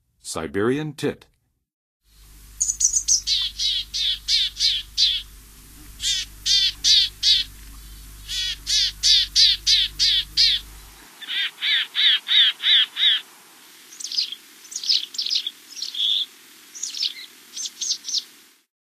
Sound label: Siberian Tit, Western USA Songbirds; N. America; Songs of Birds of North America